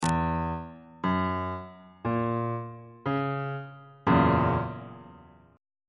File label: g flat dominant 7th 3rd inversion on bass clef